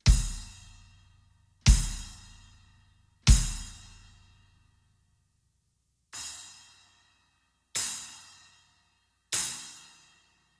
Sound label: 10″ XSR Splash